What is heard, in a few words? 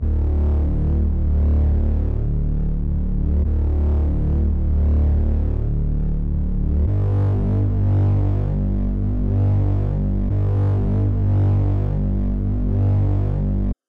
140 BPM D Min Bass, Drill; Melody; Loop Stems; Cymatics; 2022 Melody Collection; Smash